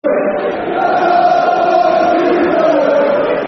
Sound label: Come On You Blues, Football Chants; fanchants; Ipswich Town Itfc